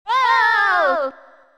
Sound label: despair; toad